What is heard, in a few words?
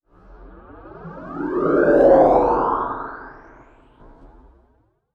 slow sweeping swoosh